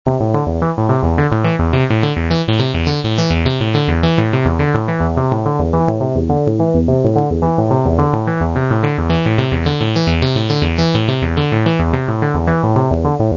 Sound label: 303 sqr like, poly